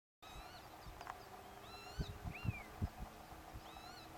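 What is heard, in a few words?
honey buzzard male long call; allen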